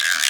Yamaha EX5, Drums

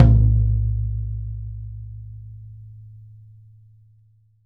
YAM18, drums; Big Fish Audio; Ross Garfield Drums VOL-2

